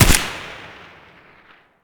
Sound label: an94 muzzle distant; STALKER; IWP; abakan